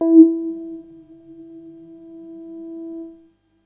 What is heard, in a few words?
bass E3